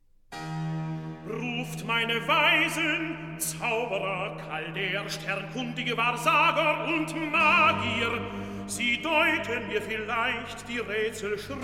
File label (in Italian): Recitativo; Belsazar; Ruft meine Weisen; Handel Masterworks; Klassika